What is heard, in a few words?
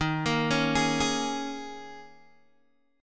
D sharp 7th Suspended 4th D sharp, Guitar Open G